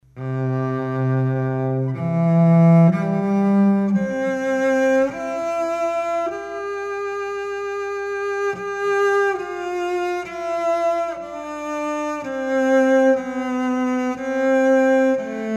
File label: acoustic